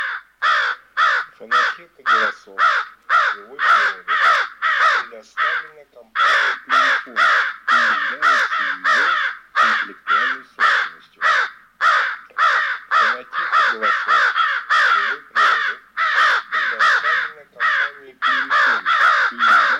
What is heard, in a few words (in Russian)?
Грач каррр Corvo; Corvo schiam